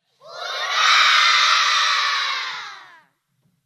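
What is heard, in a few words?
sounds and shouts of hurray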